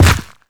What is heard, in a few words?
step heavy, zombie blind